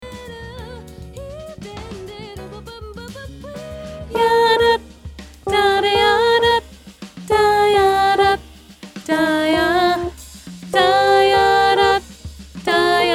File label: soprano 2; SCVA Vocal Jazz Contemporary A Cappella Honor Choir